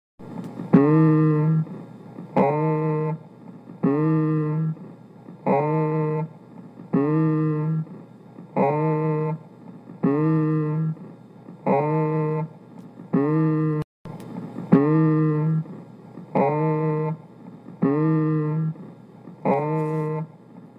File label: Egophonia; simulatorvzorky